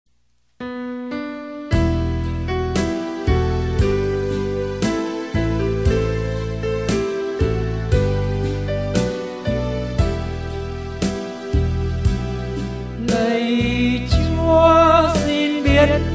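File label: soprano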